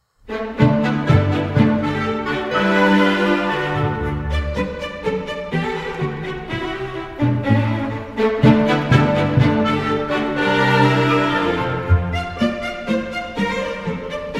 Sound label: Guitar Concerto In D major; Castelnuovo-Tedesco, Mario; No.1; Opus 99